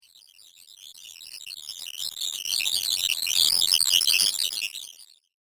Space Beetle Juice Chatter, science fiction sound effect